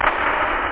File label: nosy, claps; drums